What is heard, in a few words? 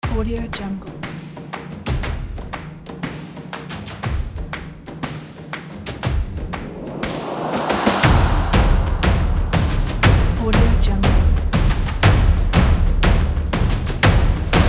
this is percussion